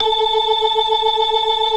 BLOOD OCT, Synth and Organ

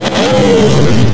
Revvy, cartoon Foley Fx; musicradar; cartoon caper

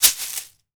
Household Shaker, household rhythm samples Hits

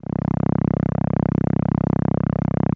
SOLINA, String Machines

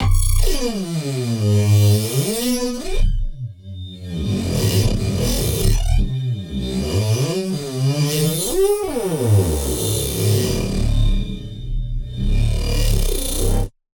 warp gate

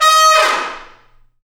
BRS TP FAL, Tpts FX Falls; Tps Falls

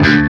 HARMONC DRAG, Guitar

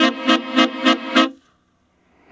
Sax Section Effect, effected sax section

